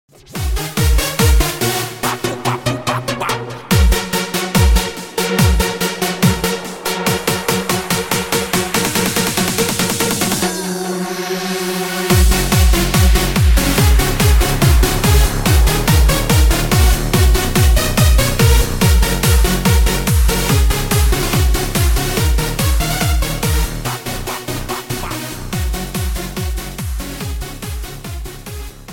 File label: Bip Bop Mix